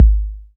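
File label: Kick 808; Roland SPD-S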